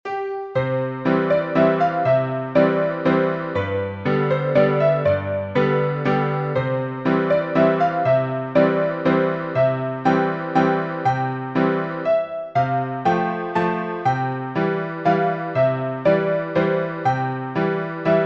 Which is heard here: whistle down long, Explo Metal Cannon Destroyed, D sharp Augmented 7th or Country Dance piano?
Country Dance piano